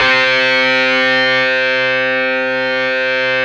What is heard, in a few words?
GTR, Dirty Buzz; Club-50 - Foundations Roland